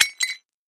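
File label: Tile Large Square Piece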